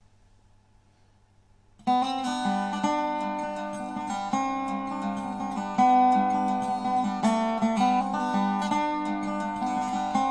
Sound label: Dobro